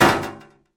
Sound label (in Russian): metall stuchit v metall grohot; holodnoe oruzhie